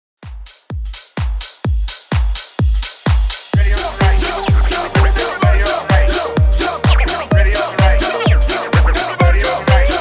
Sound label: redrum 127 bpm